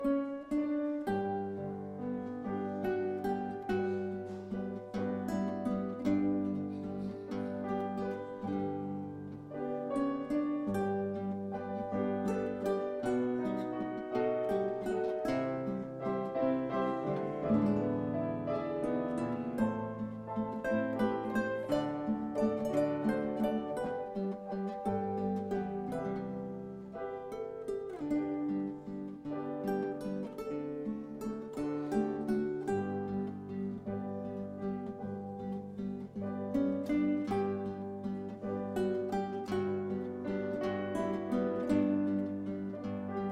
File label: Instrumental